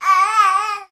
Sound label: Baby: Happy Noises And Coos